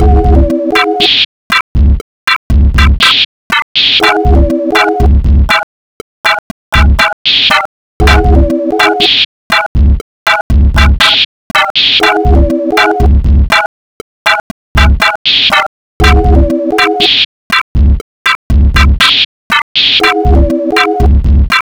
sine wave

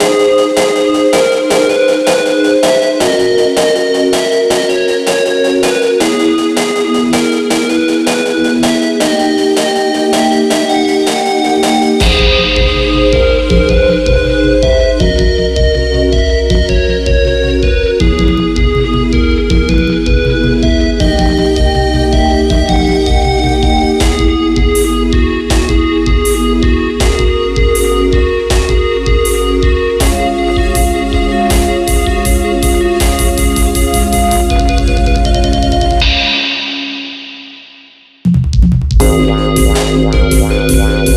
sinewave

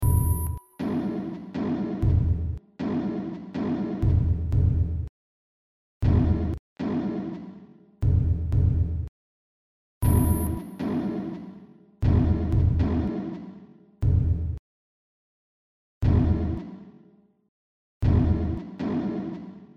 Sinewave

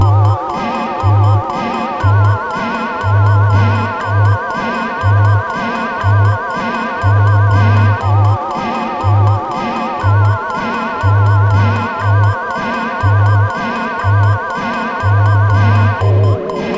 sine wave

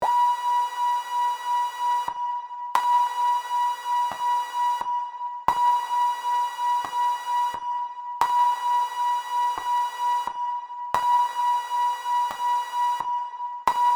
sine wave

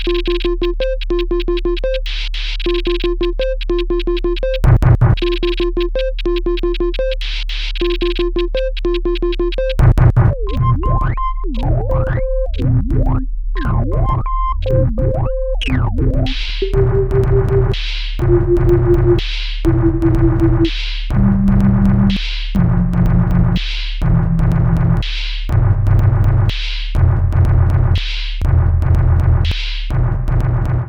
Sine Wave

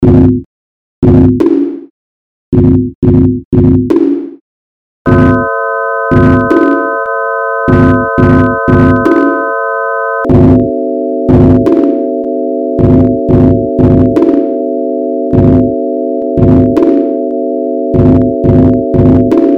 Sine Wave

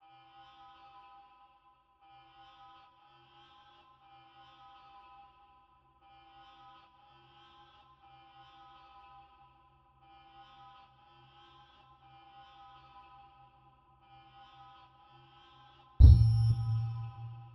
Sinewave

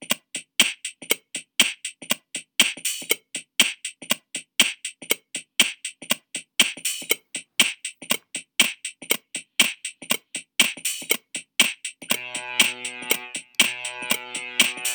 Midi

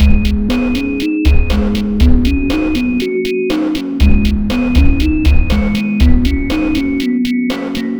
Sine

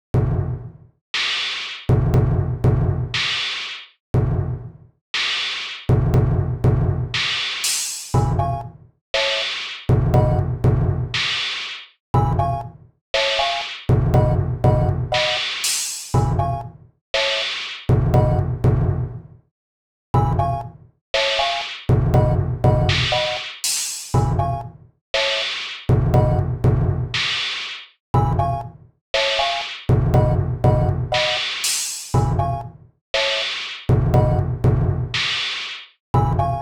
Sine Wave

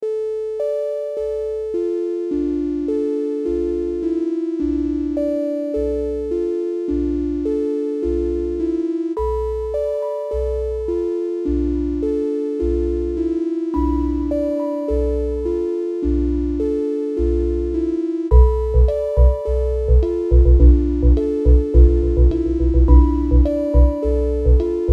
Sine Wave

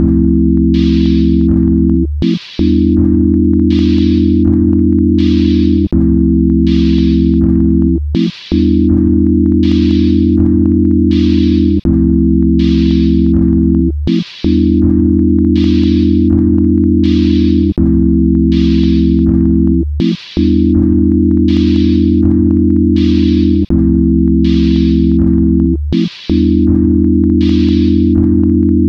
Sine Wave Assignment

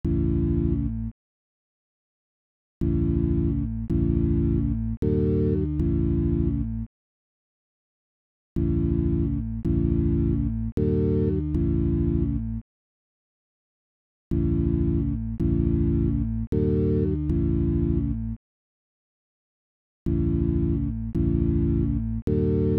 sinewave

